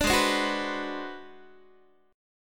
G sharp 7th Sharp 9th Flat 5th G sharp down Guitar, chord; G#7#9b5; strummed; JGuitar